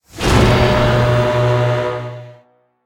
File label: BOSS WAVE BEAT